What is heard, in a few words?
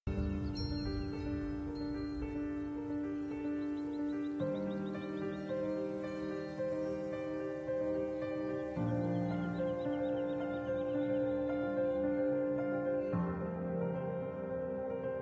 pink piano ringtone